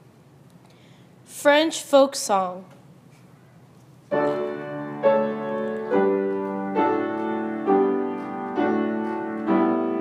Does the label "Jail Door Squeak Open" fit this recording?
No